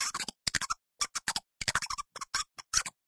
Creature Sounds Small Rat; raycaster

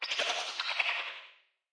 Creature Sounds Spider; Spider 1; raycaster

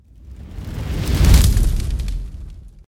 fireball; raycaster

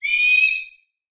Creature Sounds Marmot Scream; raycaster

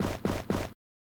step leather; raycaster

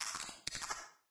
Creature Sounds Medium Rat; raycaster

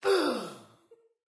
Creature Sounds Humanoid Death; raycaster

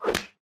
punch cartoony; raycaster

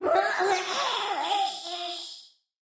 Creature Sounds Creature Death; raycaster

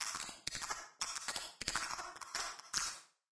Creature Sounds Medium Rat

